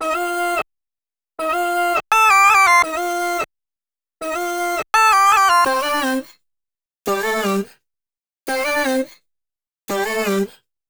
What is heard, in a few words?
Break Vocal Backing Dry 160 Emin